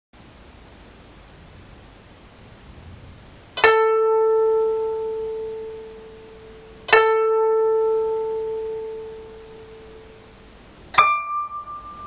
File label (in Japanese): 調子替, 箏 調弦; 神盤渉の雲井調子にする; 巾は九の１オクターブ高い音まで上げて 一を五の１オクターブ低い音まで下げる; 箏高音 D G G# C D D# G G# C D D# G G#; 壱越の本雲井調子にする